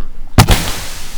gunshot

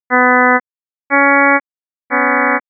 sine wave

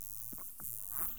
Dolphin echolocating object; Harley

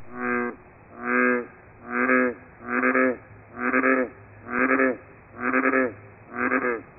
bullfrog stutter

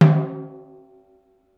Normal stroke

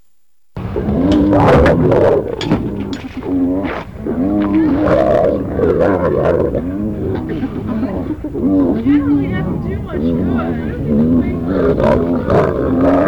hyena growl

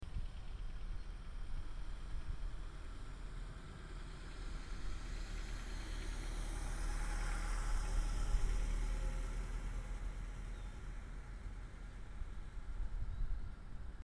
Accord 5 MPH Left To Right

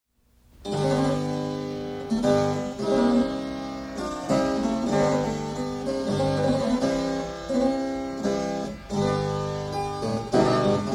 17c flemish

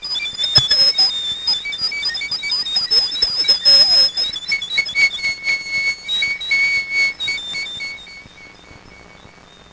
screech

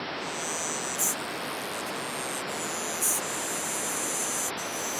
sing noise

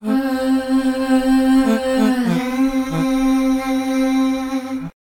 detuned sound

